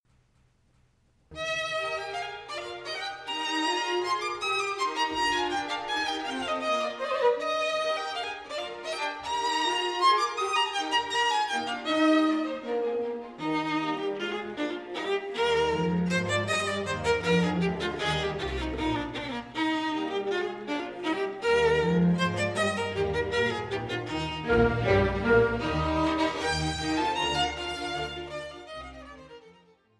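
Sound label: Violin Viola Sinf Con B flat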